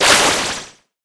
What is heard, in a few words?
water